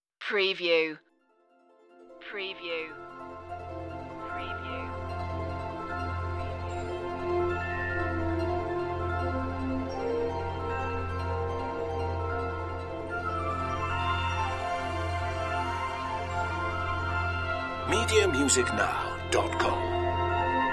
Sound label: no choir intro only